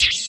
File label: RSS, 160 BPM